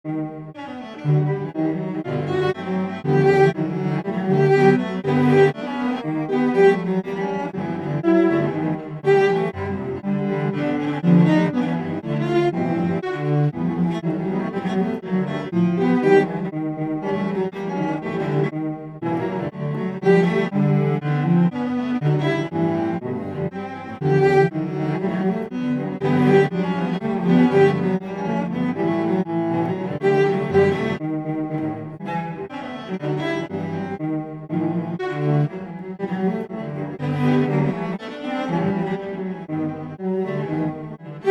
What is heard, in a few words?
classica